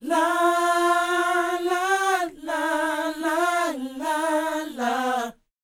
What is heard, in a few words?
NA-NA A#; Spectrasonics Vocal Planet